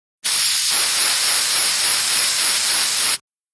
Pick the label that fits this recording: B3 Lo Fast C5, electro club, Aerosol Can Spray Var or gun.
Aerosol Can Spray Var